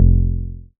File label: BAS F1, SCI Prophet-5 synth bass